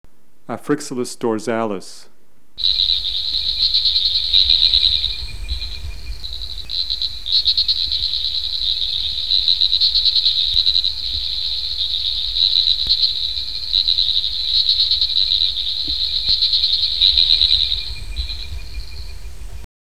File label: Afrixalus dorsalis